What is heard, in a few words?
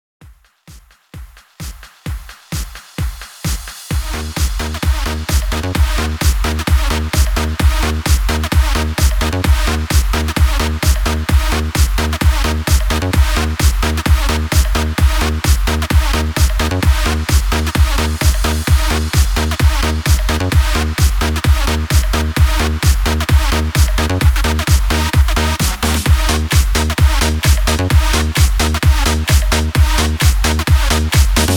130 BPM